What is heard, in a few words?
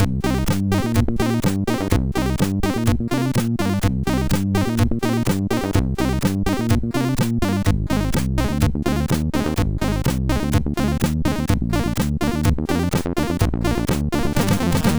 Swinging Beat, sid MOS6581R4